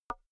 wood board knock light

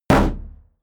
shield sheet impact clank

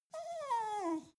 dog whimper really sad

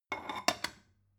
stone bowl shelve soft

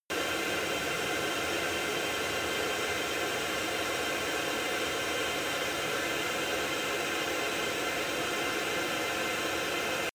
plane glider interior idle

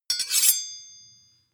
sword blade grind short ring

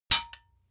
sword metal hit bright

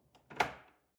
drawer large trash draw out lock

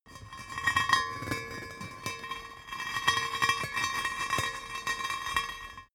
metal heavy hollow rolling concrete

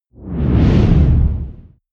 whoosh deep squall heavy short

